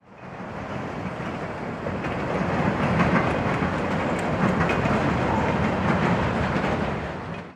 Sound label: train tracks